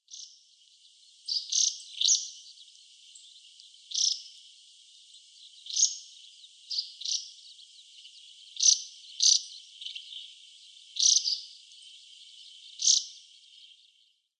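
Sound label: White-browed Laughingthrush; kaojirogabityou; watarase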